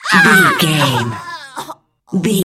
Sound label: voice female death intense